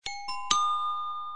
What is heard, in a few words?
Infinix Smart 8 SMS Ringtone